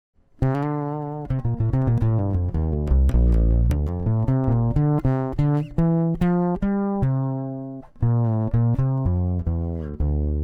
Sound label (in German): soul hals piezo hoehencut, bass